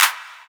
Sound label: Claps; Vengeance - Essential Clubsounds Vol.5